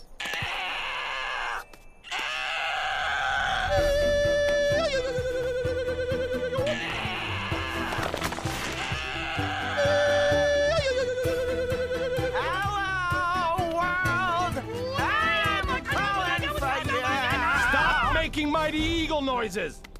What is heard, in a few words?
eagle noises